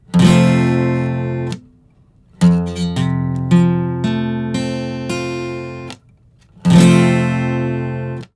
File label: Major or Minor